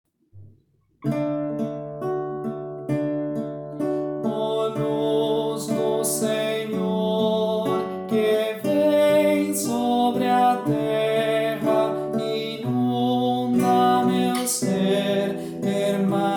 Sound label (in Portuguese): tenor; frei luiz turra